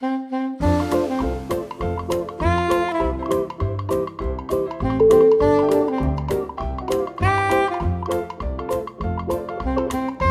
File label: happy birthday dixieland style